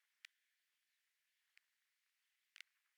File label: DRONES